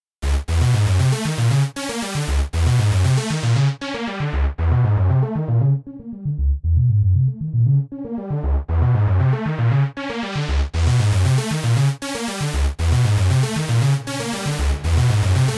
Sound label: Synth Filter